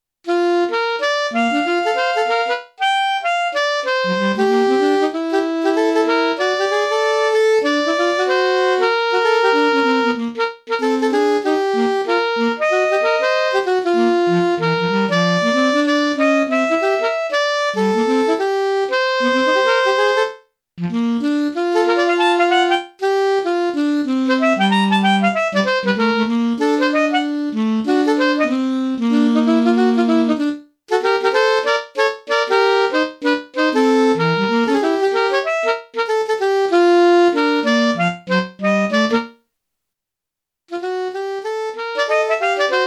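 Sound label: Alto Sax, STANDARDS ALTO SAX DUETS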